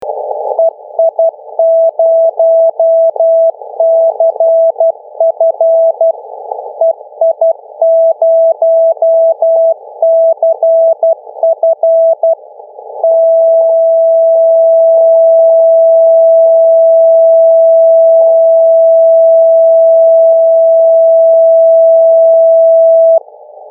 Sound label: finbar beacon